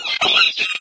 pain, headcrab poison; Source Engine; video game; npc

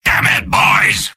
soldier negative vocalization; tf; mvm

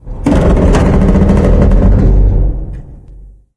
fire groan; ambient; Left 4 Dead 2; Source Engine; random amb sfx

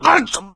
choke; voice; source engine; left 4 dead 2; survivor

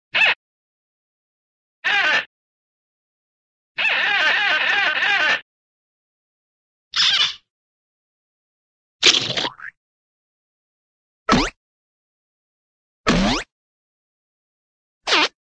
squeeze, cartoon horn squeeze, short

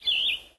forest bird, ambient; Source Engine; tf

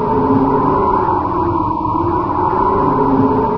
alien wind, ambience

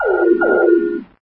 warn; source engine; hl1; video games

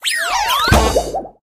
taunt sfx flip; video games; source engine; tf

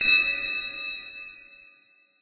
amb random tech; source engine; portal2

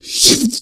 smoker tongue hit; voice

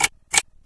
tick tock, machines; video games; ambient; source engine; portal2

